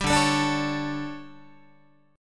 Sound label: D Major 7th Suspended 4th, Guitar; strummed